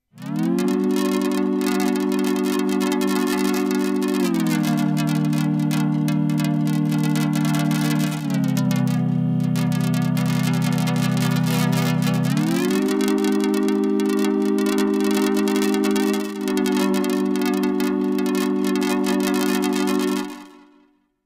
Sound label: Major7 Rain; KORG Tube